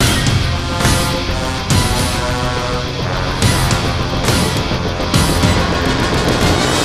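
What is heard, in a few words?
music, full band intro horns loop; transformers war for cybertron gamerip; IACON IN RUINS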